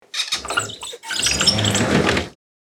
Blind crank raise up squeaky rattle; blinds; gfxsounds

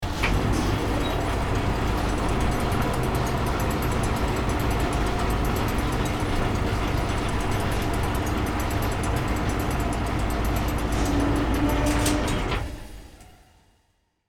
Ship winch cranking releasing

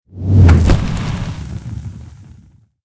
Wizard magic fire chant

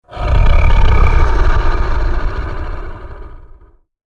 Werewolf deep growling

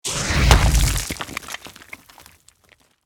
Earth magic spell large impact; fantasy

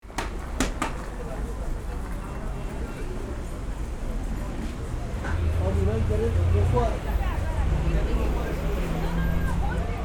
Market ambience indoor crowd

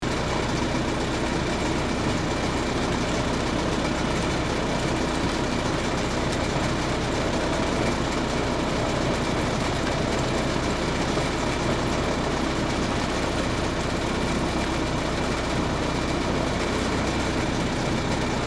Boat engine room sailing loopable

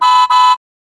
Toy car horn

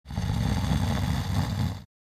Deep heavy snoring short

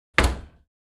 Kitchen fridge door close

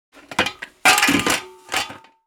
Fire extinguisher removing from the wall

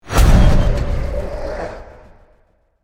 Horror accent eerie hiss

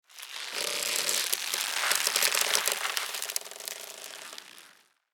Rope tighten and stretch long

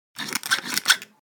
Rusty garden shears cut snap

